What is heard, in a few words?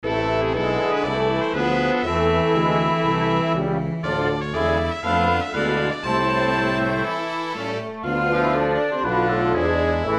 dark march